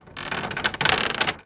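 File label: wood creak shipwreck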